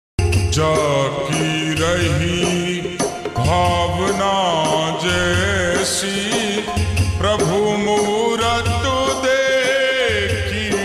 Jaki Rahi Bhavna Jaisi Prabhu Murat Slowed Reverb Ringtone